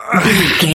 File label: voice male effort short